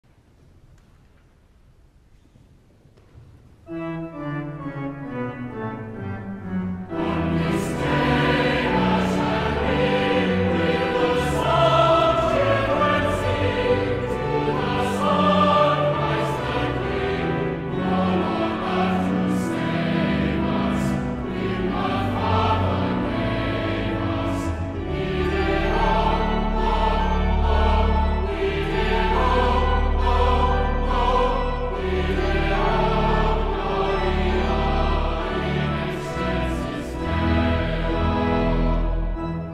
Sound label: choir